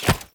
Foley Chest Thump; Characters; Enemy; Melee Grunt; Sinecure

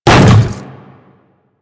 Hammer Boss Step Default, Footsteps; Warframe; Grineer

